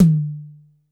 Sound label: C3 - Jazz Tom, Yamaha PSR-E323 Symphony Kit